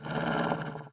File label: Dog Angry